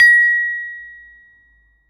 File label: Saron P, Gamelan